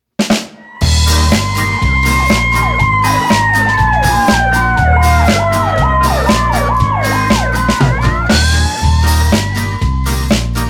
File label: Firetruck Karaoke